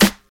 Smooth Steel Snare Drum Sound C Key, acoustic snare sound tuned to the C note